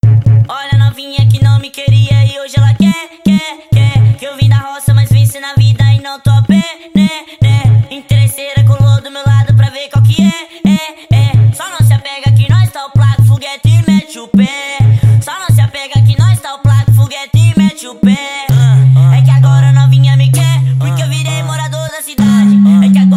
funk bass; carretinha pertubasom

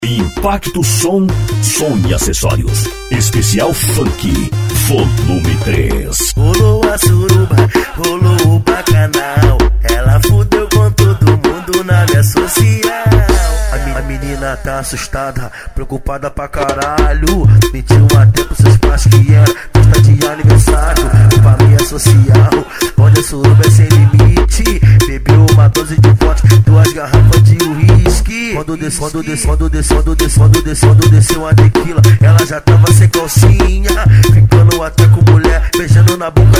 funk bass; impacto som

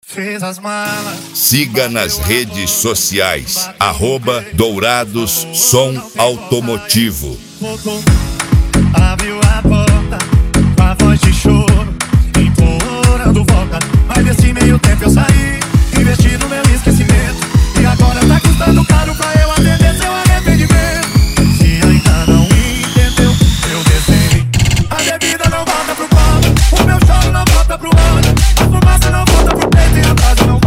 mega funk; dourados

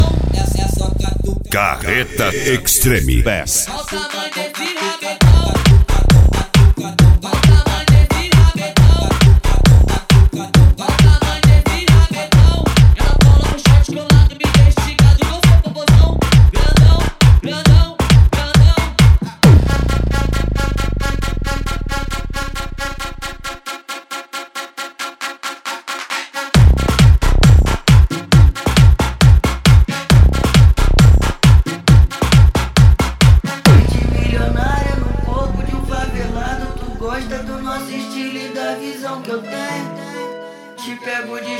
extreme bass; carreta

